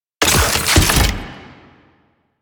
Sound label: Scan Pillar Plant Close; DE; Warframe; Frumentarius; Scan Ability